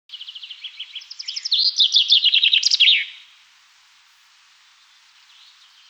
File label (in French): pinson des arbres